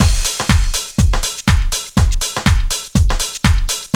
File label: house loop